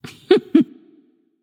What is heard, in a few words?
heh heh light; smite; voicepack; hel